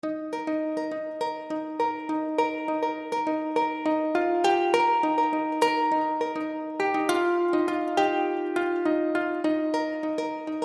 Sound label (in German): Countryside Strings nur Ukulele